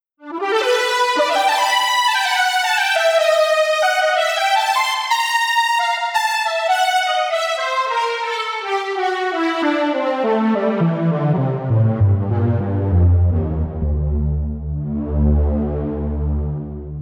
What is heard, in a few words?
saw